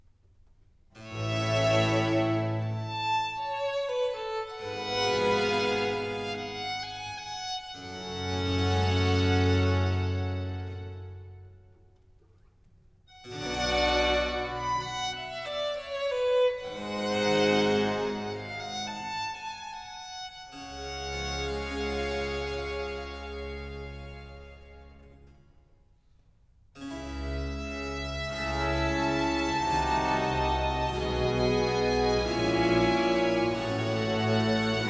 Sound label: Concerto in F major, II. Adagio; Concerto No.7; RV 567; Vivaldi